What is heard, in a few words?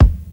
good kick drum sound d sharp key; kick drum samples